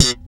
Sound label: POP B, bass; Spectrasonics; Bass Legends CD 1